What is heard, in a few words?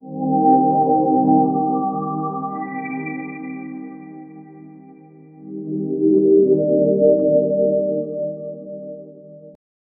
soft emotional pad low